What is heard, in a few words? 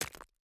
environment pickaxe hit stone